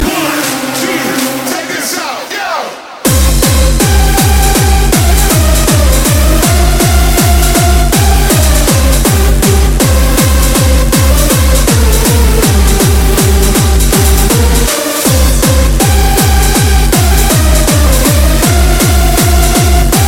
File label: Hardstyle